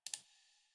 Computer Mouse Click Misc; Warframe; Ambience; Nineteen Ninety Nine

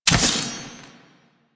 Machete Finisher Pull; Sentient; Warframe; Quests; The New War; Kahl; Weapons

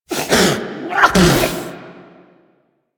Pet Sneeze; Enemies; Warframe; Catbrow; PetShip

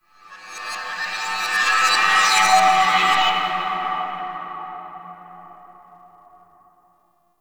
Undercroft Rev Delay Warp Space One Off, Ambience; Warframe; Duviri

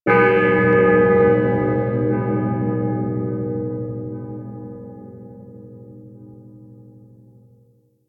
amphitheatre bell; Ambience; Props; Warframe; Duviri

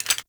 Flame Spear Reload; Grineer

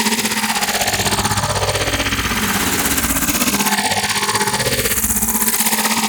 Key Beam Loop; Enemies; Warframe; Captain Vor

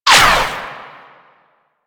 Ranged Weapon Whizzby; Warframe; Necramech

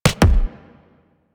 Weapon Punch Double

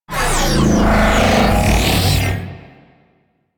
Space Fighter Coil Agent Split Ability Stop; Warframe; Enemy Ships; Space Fighter Sentient Coil Agent

